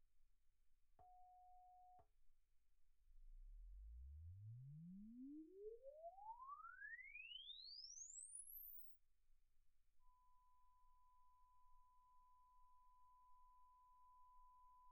cal meas signal measured; Alesos

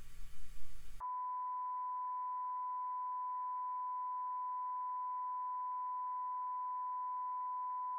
Sin calib rec; Alesis inside

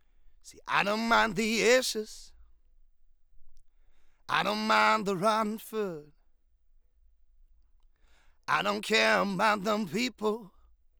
Voc

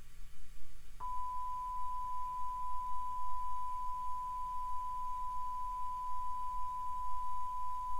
Sin calib; Alesis inside

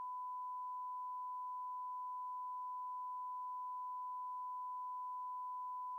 ref -45 dBFS 20-20 kHz sin 1000 Hz

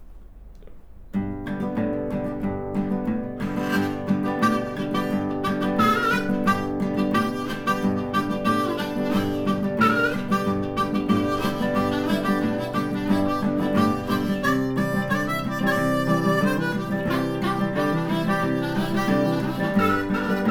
HOA guitar harmonica

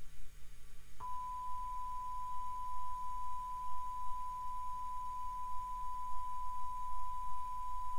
Sin calib; Alesis inside

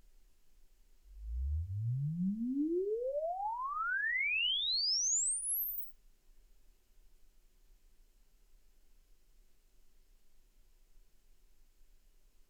Loudspeaker uncal sweep

